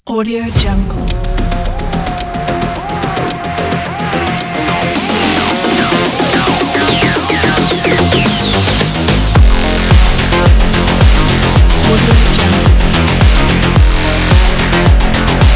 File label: the techno